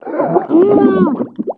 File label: gurp; quake2; bobafett